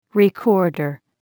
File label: recorder